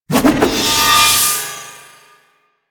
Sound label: Staff Heavy Charge; Warframe; Duviri; Dax; weapons